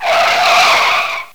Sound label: Tire Screech; Drums; XGSfx